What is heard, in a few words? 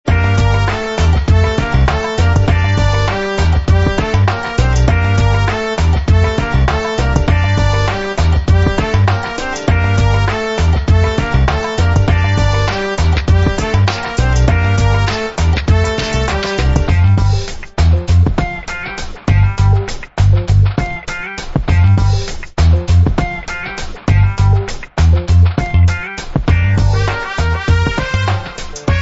Bay Area Beat